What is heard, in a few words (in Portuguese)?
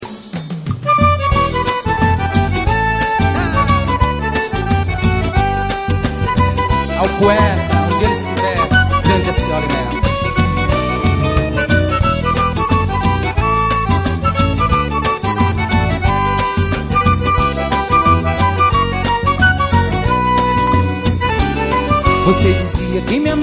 forro